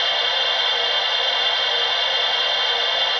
Laser Beam, laser sound effect made in BeepBox, but this time it's a continuous beam